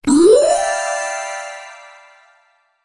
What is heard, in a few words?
funny sound ting tong